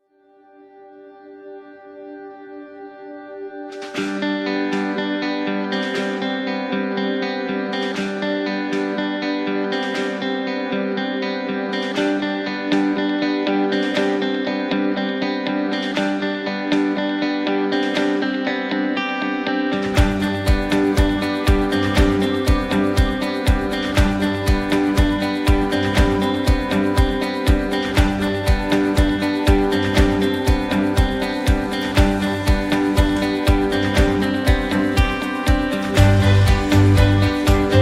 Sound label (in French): Musique ambiance